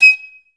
STAC D#6; Best Service; Peter Siedlaczek's Advanced Orchestra

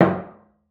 CELLO FINGER; 90 s Sample CDs; Xsample VOL-5

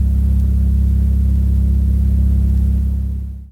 Sub, drum

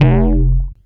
106 Bass F, One Shot Bass

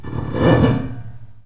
LO SCRATCH; FX; InVision Interactive; The Piano

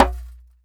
DJEMBE CLSLP

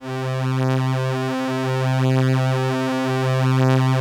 LAMBDA, String Machines

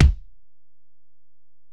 Ac BD, Alesis DM-PRO; 300 Drum Machines

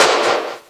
Jomox Xbase888

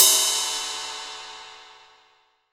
TIP, 18 ZIL RIDE

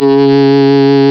REED C#; Big Fish Audio; Synclav FM

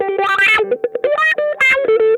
STRANGLED, Funk Guitar; Zero G - Funk Guitar

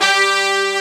BRS G2, BRASS SECTION; AKAI S6000 CD-ROM - Volume 1; BrassWind

